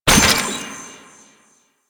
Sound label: Courier Trinket Collected; Warframe; Duviri; Courier Delivery